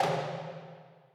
perc

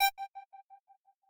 synth

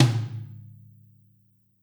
tom

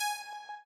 arp

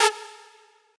synth

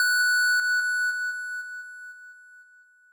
lead

